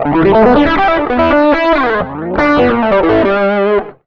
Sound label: SOLO R, Electric & Acoustic Guitar Loops